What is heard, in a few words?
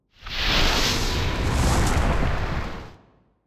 multiplier wheel rotation start; arcane